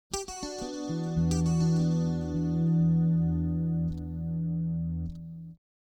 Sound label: Guitar, Hip Hop Exotica, 102 Emin